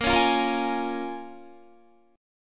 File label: Bb Minor 7th, Guitar; Bbm7 strummed